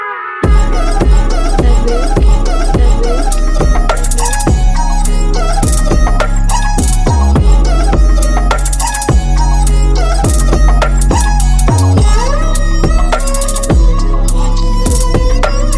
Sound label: Punjabi Music Ringtone